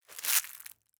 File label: paper rip